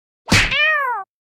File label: boss hits hero